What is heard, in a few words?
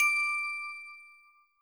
bell